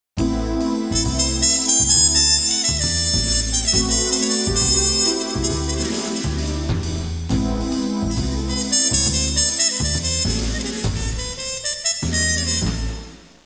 suonerie musica jazz

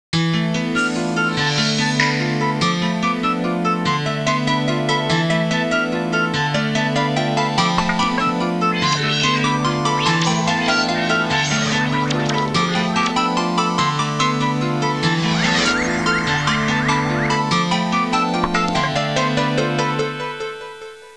musica elettronica

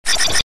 suoneria botton; beep

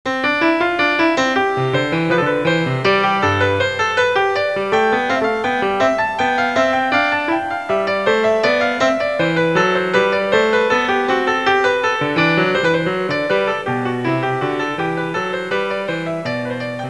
suonerie classica; musica classical

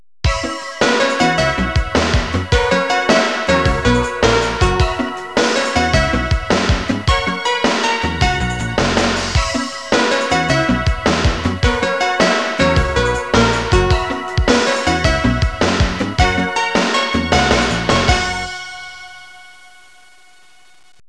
suonerie businessy

